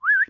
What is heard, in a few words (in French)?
siffle